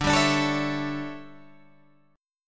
D Major 7th Suspended 2nd Suspended 4th D down Guitar Open G